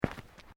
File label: Footsteps Sneakers Road Step; Footstep Foley; Human